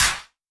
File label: Wider Clap